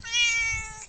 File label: meow; space kitty